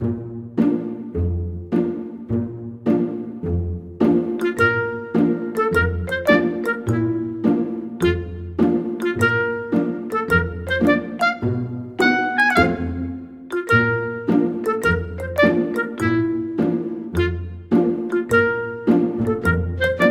BGM; Cookie Run

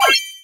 jump; cookie run

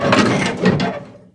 Cannon Aim; Captain Ice

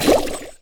sea shooting; agar agar skill; Cookie Run

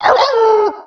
Werewolf Transform Start; Cookie Run

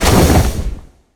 grab rush; red velvet; cookie run

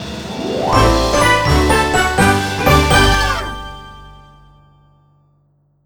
mission clear; memories island; Cookie Run

